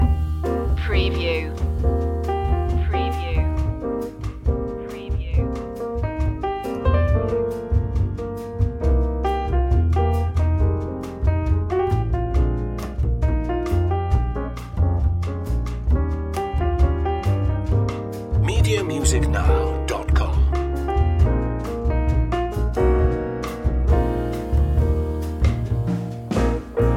a passing glance loop

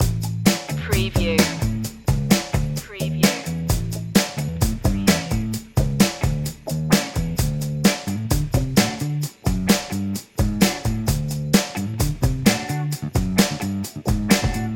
funky rhythm loop

